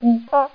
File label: monkey